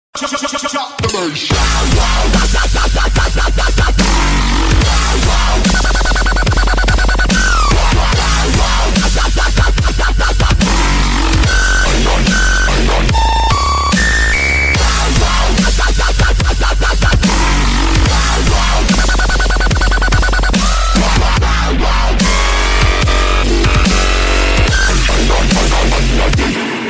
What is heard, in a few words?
mint dubstep